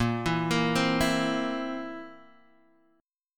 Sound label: Bb Suspended 2nd Flat 5th, Guitar, Drop D